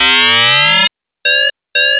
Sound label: Lockdown 2 Pulses